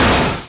car collision; heavy car collision

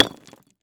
rock smash

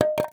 wood plank drop

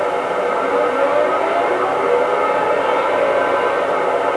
AIR RAID

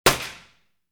colt shot, colt 22 handgun interior shot

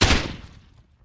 Grenade Explosion; grenade exploding

